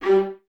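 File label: violas G 3 staccato, String Ensembles; Miroslav Vitous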